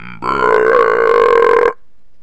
Burp

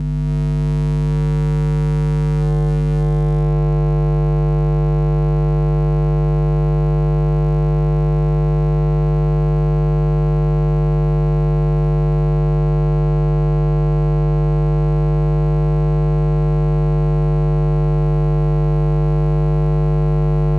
Electricity Ambience

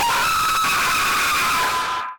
Horror Scream

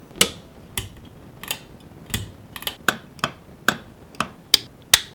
Light Switches

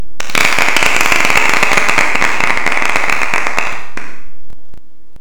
Applause

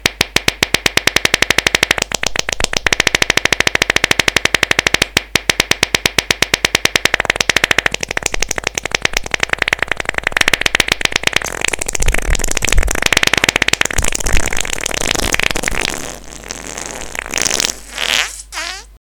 Long fart

